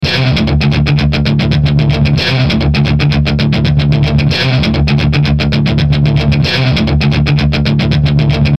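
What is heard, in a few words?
Musical Element, Drum Snare March; Blastwave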